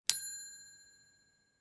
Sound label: small bell ring